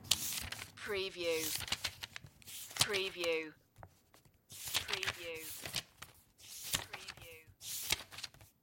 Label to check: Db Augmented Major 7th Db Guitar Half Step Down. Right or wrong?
Wrong